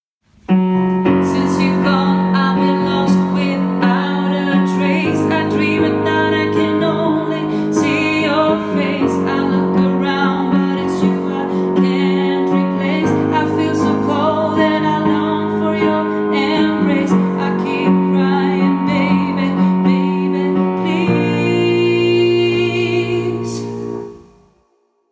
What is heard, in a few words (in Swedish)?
stämma c